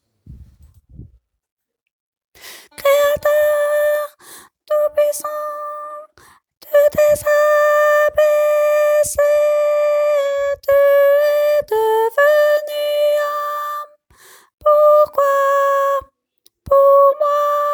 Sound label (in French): ténor; 167 – Créateur tout puissant, tu t’es abaissé